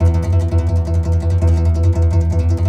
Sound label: C. Bass Balalaika, Plucked Strings